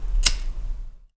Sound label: lighter